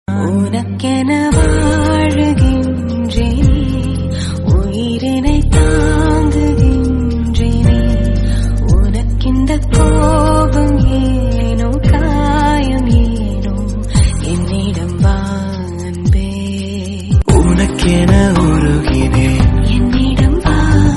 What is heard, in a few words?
female version ringtone